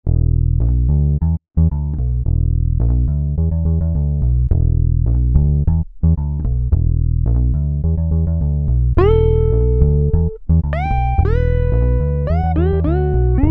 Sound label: bass